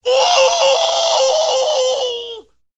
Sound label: cartoon yell